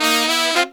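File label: Pop & Funk Brass, 110 F, left; Best Service ProSamples vol.25; AKAI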